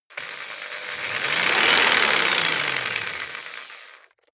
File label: jigsaw engine medium fast short